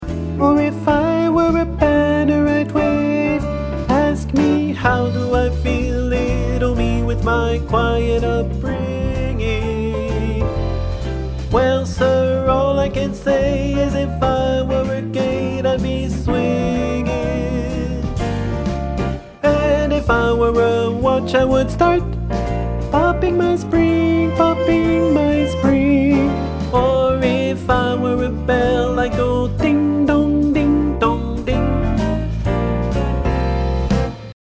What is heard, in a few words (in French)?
Ténor, ensemble vocal jazz; ensemble vocal jazz de larquemuse